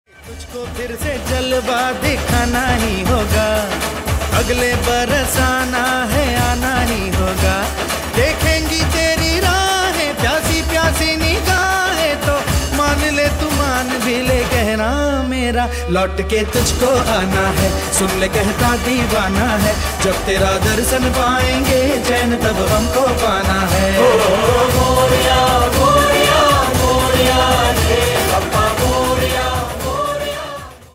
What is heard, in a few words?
ringtone ganesh chaturthi special ganpati bappa morya ringtone song